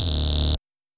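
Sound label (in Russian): звуки для викторины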